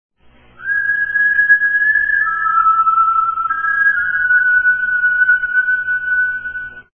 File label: high pitch whistle